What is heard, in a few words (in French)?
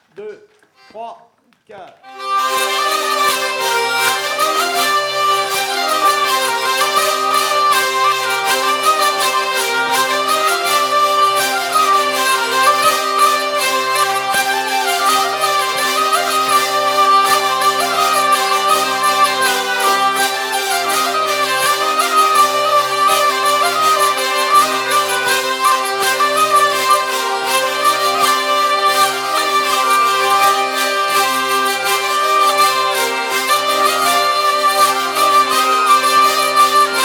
vielles; vielles 2; festival